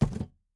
phys cube sm; Portal 2

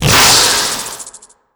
bar jump, headcrab; creatures

